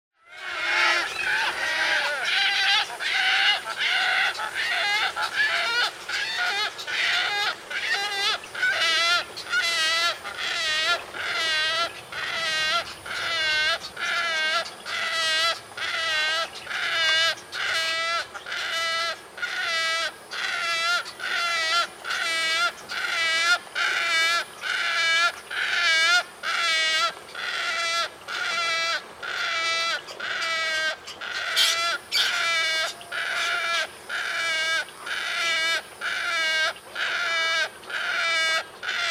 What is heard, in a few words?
Wood stork; لک لک جنگلی